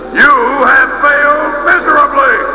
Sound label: failed